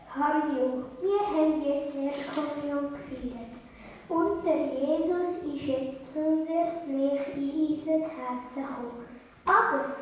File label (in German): Schlussgebet, Gesang; Erstkommunion